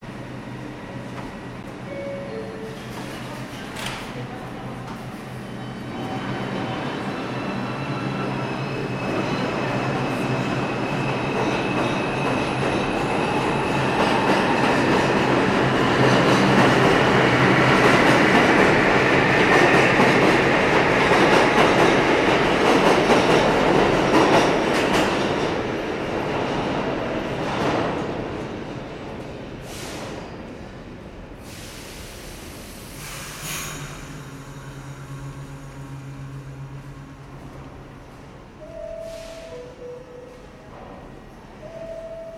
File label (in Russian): metro v nyu-jorke